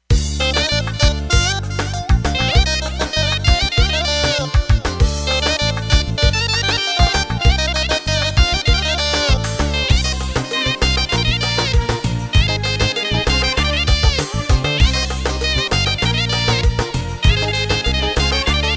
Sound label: lead, gw 8